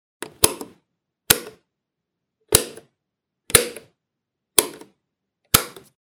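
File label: switch; zvuk vykljuchatelja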